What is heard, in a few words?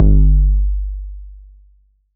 808 bass drum Tape Sat; Goldbaby Tape808 & Tape909; The Tape 808